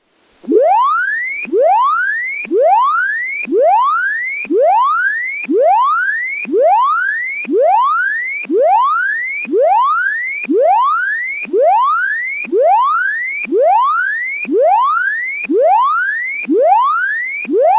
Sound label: combined fast sweep